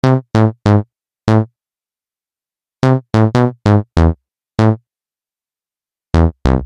saw

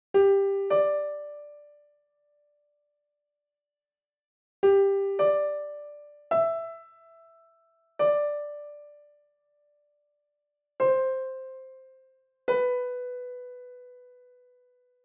keys

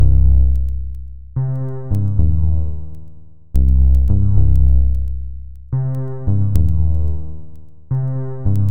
synth

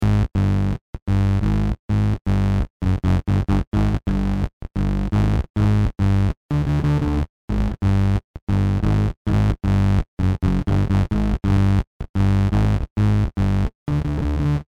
neon bass

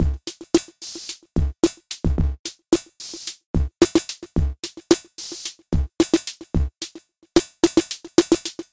beat

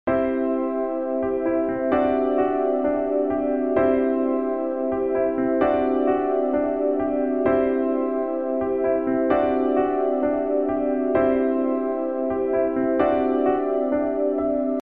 130bpm keys; plugsound

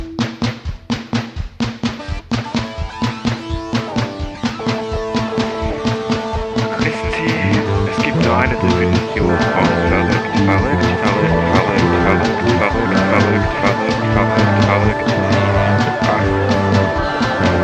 waltz

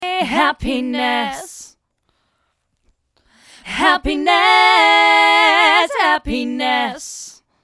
vox chor

